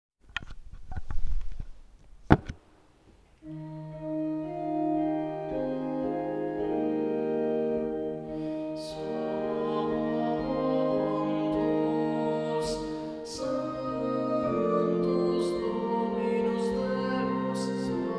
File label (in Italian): Sanctus. Soprani e tenori, coro; Coro Isola del Giglio; Messa di Bottazzo